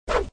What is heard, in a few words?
swing; Chibi Knight